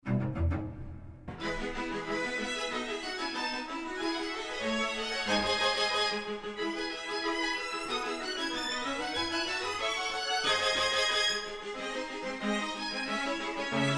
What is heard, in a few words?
Sync Wavetable Soni